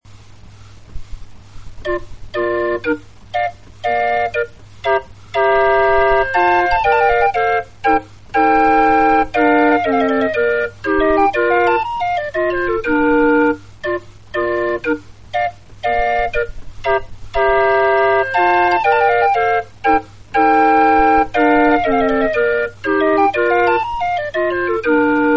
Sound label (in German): floetenuhr